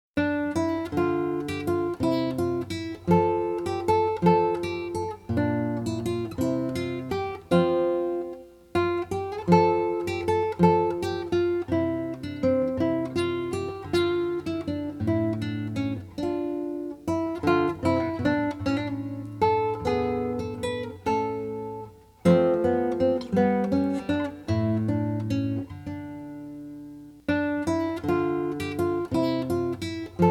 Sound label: Classical Guitar, Baroque Spanish Dance; Gaspar Sanz